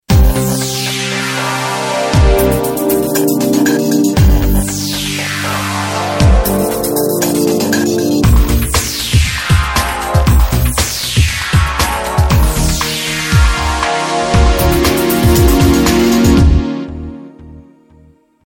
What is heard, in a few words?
cool music for ringtone